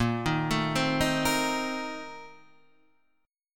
Bb Major 7th Suspended 2nd Suspended 4th, Guitar, Open D